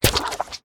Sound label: Queen Ranged Attack; we are the dwarves gamerip 2016